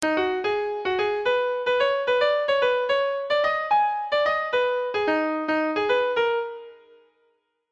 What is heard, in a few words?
iphone ringtone